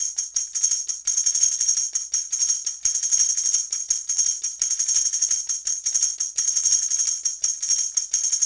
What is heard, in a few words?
170 Dance Tambourine; Dance DJ; Percussion; Digidesign; Pro Tools; Pro Tools Essential Loops